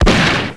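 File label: shotgun; duke nukem total meltdown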